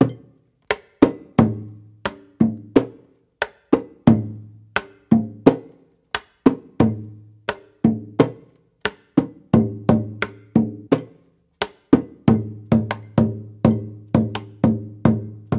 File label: tam tam loop; mic b